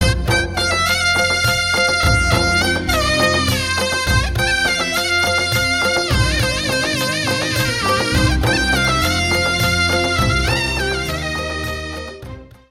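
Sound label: Trumpet BGM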